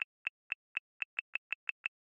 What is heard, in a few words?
Camera Timer 2 sec; OnePlus 7T